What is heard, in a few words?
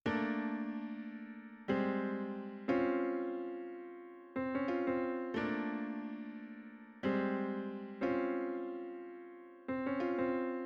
synth; bombogroove